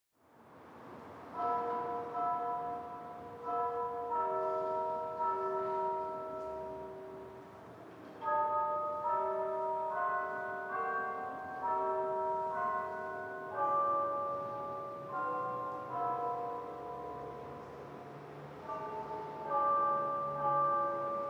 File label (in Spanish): Campanarios; OscarDeAvila